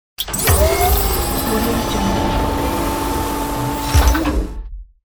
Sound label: hydraulic switch on